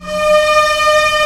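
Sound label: STR A0 PP, strings; Sound On Sound - Peter Siedleczek's Orchestra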